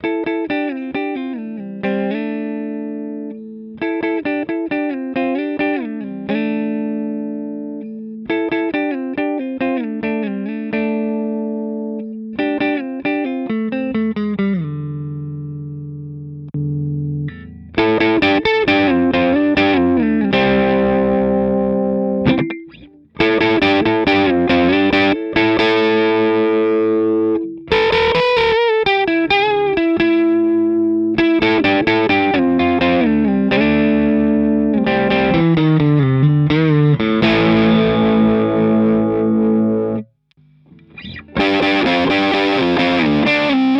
Maybach Lester Junior Double Cut Both Clean Boost Overdrive